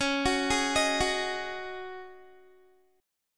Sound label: Db Suspended 2nd Suspended 4th Db Guitar; arpeggiated; 21,21,18,20,18,x; 4E,4A,5D,5G,5C,6F; Dbsus2sus4